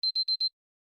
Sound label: Timer Beep; Honor; X7C; alarms